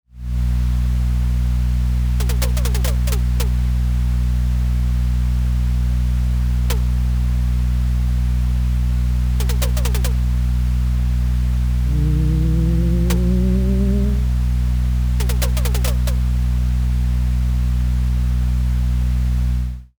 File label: office room tone